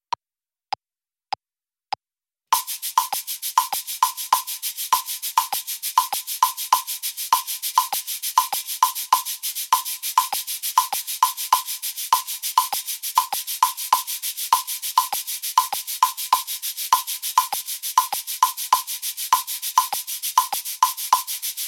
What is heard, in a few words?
Cabasa Foot pedal; shakers